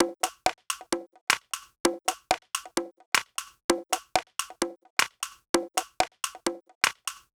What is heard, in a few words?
Cn Cp Loop 125; Equipped Music; Loungin House; Perc Loops 125